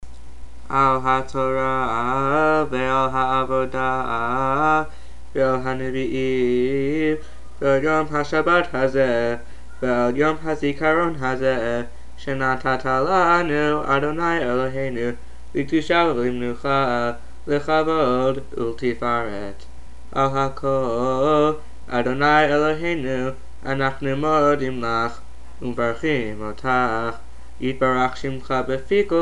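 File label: Bracha after haftarah; RH D1 Shabbat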